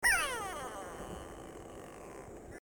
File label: corythaixoides concolor; musophagidae; calls